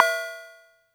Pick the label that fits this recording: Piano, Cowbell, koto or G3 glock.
Cowbell